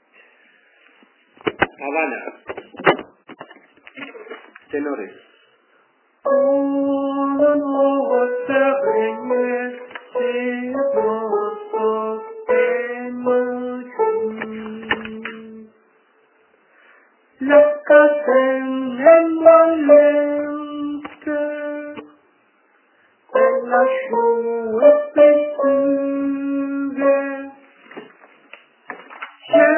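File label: tenor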